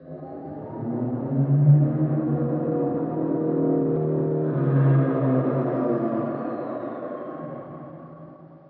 apc distant, streetwar; Half Life Model Pack; Half Life 2; ambient levels streetwar

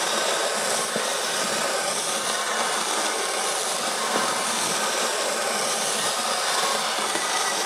scrape smooth, popcan; physics; props; open

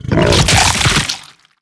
bite attack, creatures, barnacle; Half Life Alyx

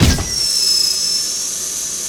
explosive gas leak; physics; destruction

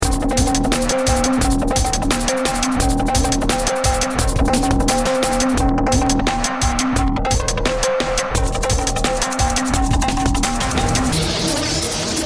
start action, music

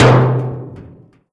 impact, metal barrel

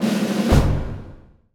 achievement earned; Counter Strike Model Pack; CS:S; ui

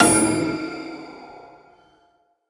ghost impact, halloween; player

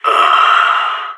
ct death, sas; agents

